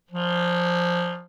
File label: clar pitch ratio mix rough rms norm; ANGUS